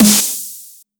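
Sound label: 140 snare bip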